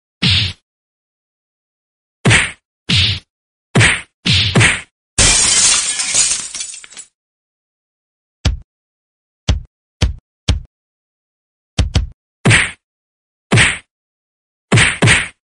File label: Boxing